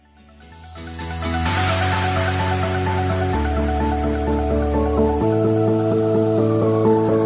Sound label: Massage Ringtone